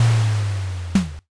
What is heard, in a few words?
drawbridge